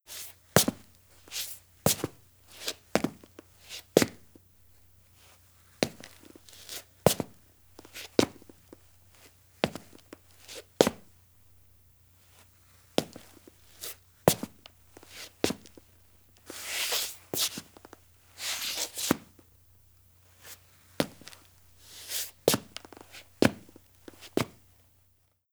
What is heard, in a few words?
foot scrape male concrete close; Project Zomboid v41.73